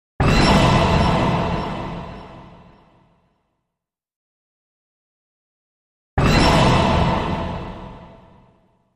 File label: Stabbing Hit